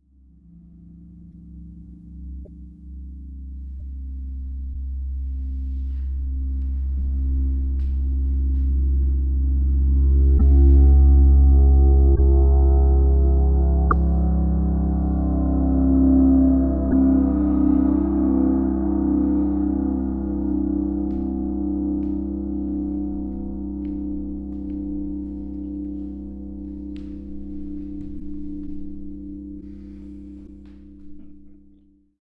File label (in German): Tamtam Reibetechnik